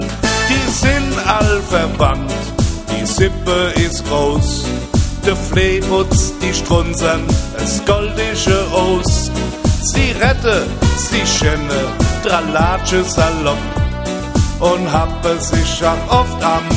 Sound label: Reggae